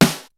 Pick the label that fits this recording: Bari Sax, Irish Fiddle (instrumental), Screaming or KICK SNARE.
KICK SNARE